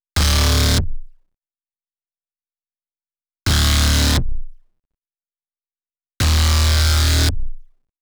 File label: weird bass